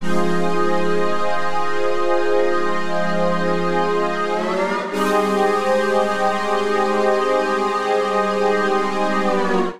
Encryption Pad Chords F Minor 90 bpm; MIDI Clips; Accompaniment; Ableton; Chop and Swing

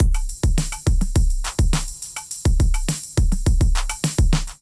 Uluru Sky Kit groove 104 bpm; MIDI Clips; Drive and Glow; Ableton; Factory Packs

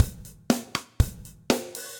Kit Bright Room, drums; Standard Kits; Ableton; Session Drums Studio

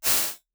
Gated Ambience Big, Drum Reverbs, IR Samples; Convolution; NI Kontakt 5

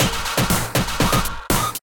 Scorched Kit, Drums

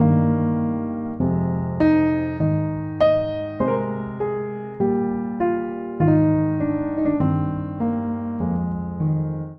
Phrase Eb Major 100 bpm, Upright Piano; MIDI Clips; Packed Bags; Upright Piano by Spitfire Audio; Ableton; Factory Packs

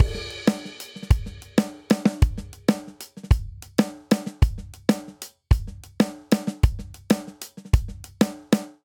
Simple Seven Verse 7/8 190 bpm, drum; Ableton; Factory Packs; Drum Booth

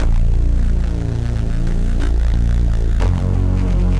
Darkness Bass, Synth; Ableton; Factory Packs; The Forge by Hecq

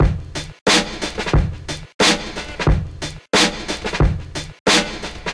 Kit-Uprockable, Drums; Ableton; Breakbeats by KutMasta Kurt

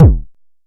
analog attacked, Kick; mpk mini presets; Ableton Foundation FreePacks

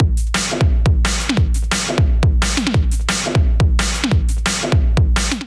Beat 175 bpm; MIDI Clips; Ableton; Factory Packs; Skitter and Step; Jump

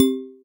Ceremonial Chime, Mallets; Ableton; Factory Packs; Synth Essentials; Collision

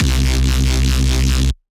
Bass; Rinsin Gritt; Ableton; Skitter and Step

